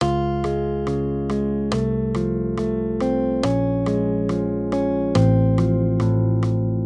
Accompaniment, Major Triads, Root Movement Four Semitones, Db-F 140